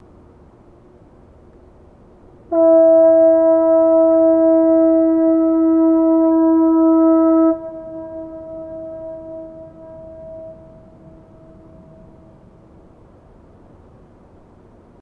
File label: Foghorn blast